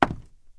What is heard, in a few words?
wood step; Golden Crayon Sounds